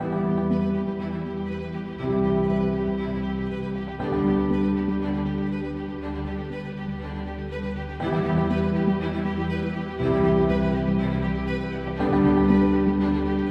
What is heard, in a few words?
Victory Ambient